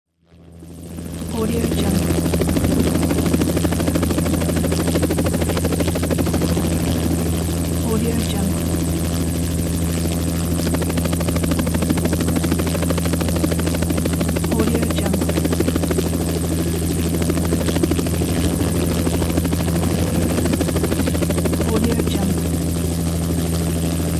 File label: helicopter; هلیکوپتر نزدیک